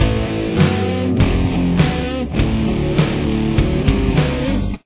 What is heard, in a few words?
chorus, guitar